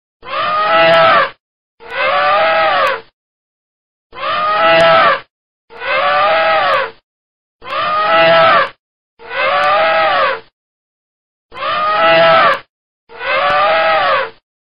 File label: Elephant